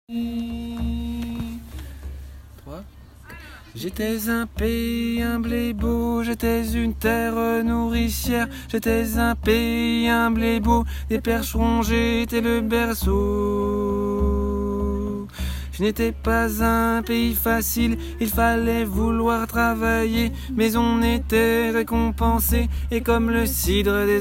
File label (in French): bourdon